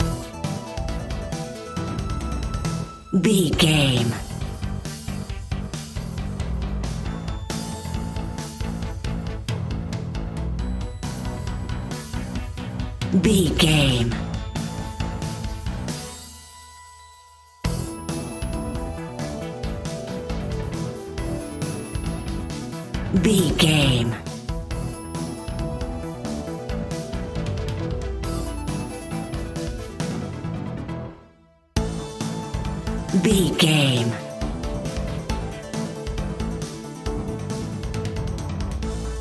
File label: 80s synth rock anthem